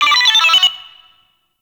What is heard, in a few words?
Friendly Hack Jingle; Warframe; Entrati; Necramech Hack